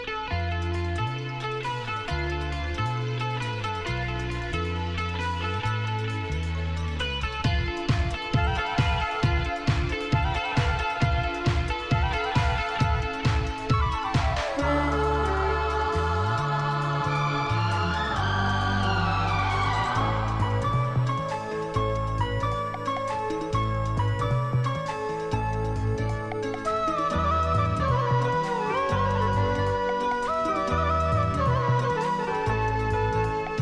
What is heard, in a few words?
Instrumental Ringtone